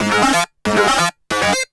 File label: tek 138 f, Techno, pure-synthl; instrument-loops